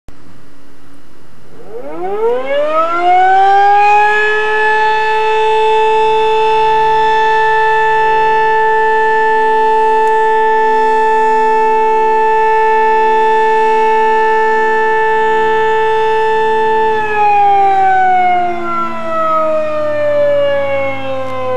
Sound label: sirens; mtroyal nj